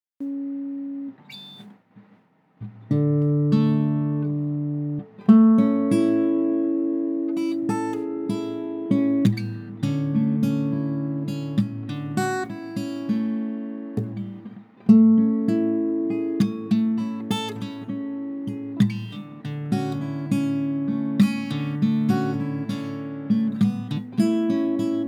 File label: A 100 Mellow Acoustic GTR